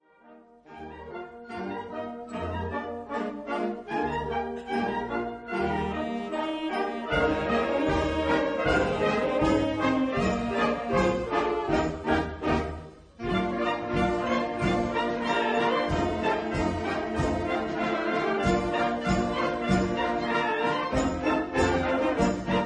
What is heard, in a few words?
polka